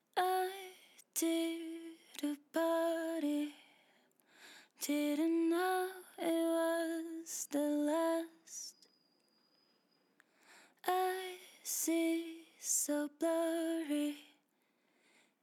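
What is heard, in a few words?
pop rnb 90 E minor, Acapella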